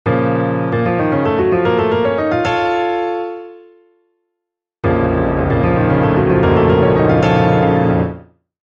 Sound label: Theme or motif